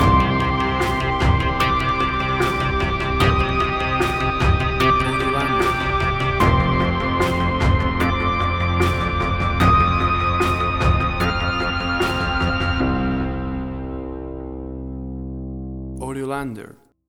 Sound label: Big Atmospheric Tension